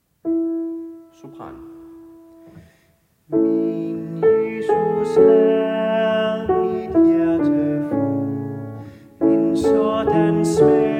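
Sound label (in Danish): Min Jesus Soprano; laudate kirkens folkekor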